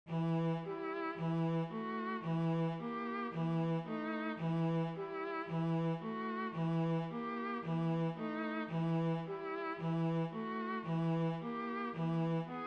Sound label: Sugar Plum Fairy orchestra; Piano